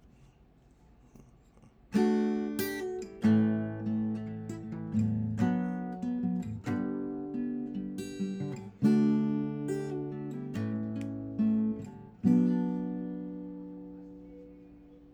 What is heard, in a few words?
Guitar AKG Lyra